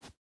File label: footstep boots sand jump start; Rust